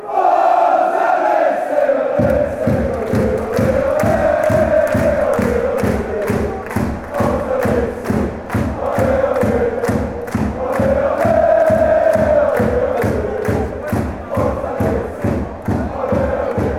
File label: forza racing, fanchants